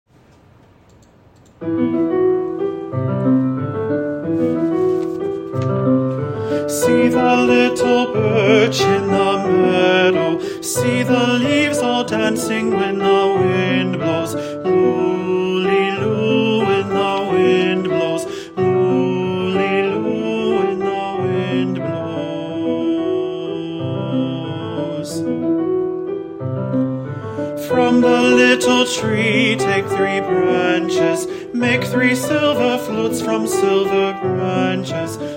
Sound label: Soprano